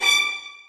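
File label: 2nd violins staccato c#6; Sonatina Symphonic Orchestra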